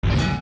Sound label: Steps Iron Boots Jump